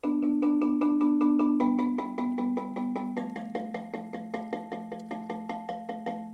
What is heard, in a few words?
transition, marimba